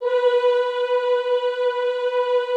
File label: B3, Classical Choir; Best Service; Extended Classical Choir